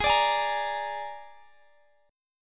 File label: Ab Suspended 2nd Flat 5th chord; Absus2b5; strummed; chordshape; x,x,18,19,21,18